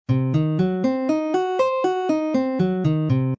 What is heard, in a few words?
c diminished arpeggio pattern, guitar